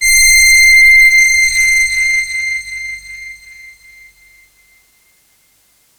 big brass C6

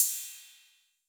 Cymbal A 808 Decay A, Clean, Digital

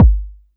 BD

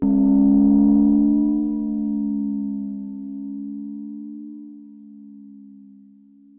Bell Intervalz Low; Twilite

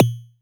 Noise

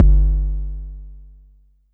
BD; TRX

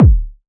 KICK

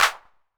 Clap 808 Color, Hand Clap

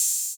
OH 808 Decay, Open HH; Clean; Digital

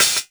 Hihat

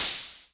Cymbal Noise Classic

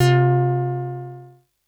SYNTH

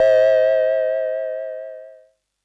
SYNTH Church Bell

